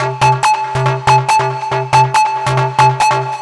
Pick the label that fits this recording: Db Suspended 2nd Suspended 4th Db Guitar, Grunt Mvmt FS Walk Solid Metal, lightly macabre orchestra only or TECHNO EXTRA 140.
TECHNO EXTRA 140